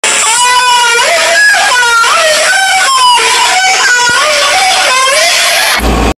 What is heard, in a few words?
yah ahh